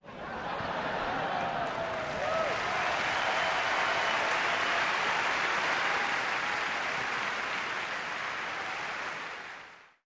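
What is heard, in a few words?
Crowd 50000 Laughs, large interior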